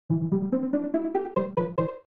Unused Jingle

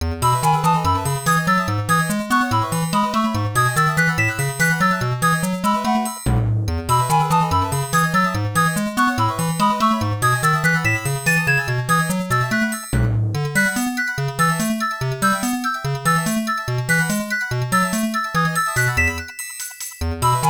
Conversation Screen; Densetsu no Starfy; GBA

